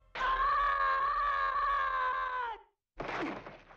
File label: yell; charlie brown